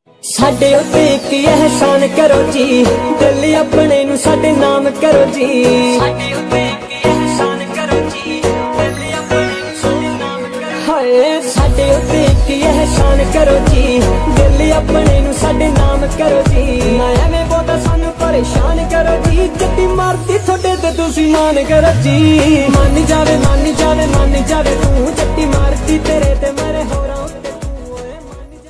new punjabi ringtone new punjabi love ringtone new punjabi sad song ringtone